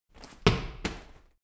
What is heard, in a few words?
medicine ball wall throw, fitnessstudio